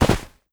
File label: snow; Rust